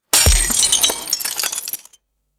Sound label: sharp glass impact and shatter